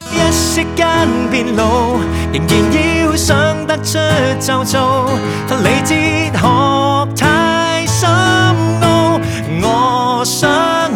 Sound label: Acoustic Version